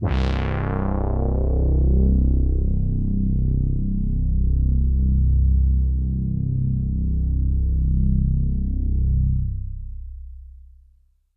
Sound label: Pad C, Synth Prophet One Shots; Electronisounds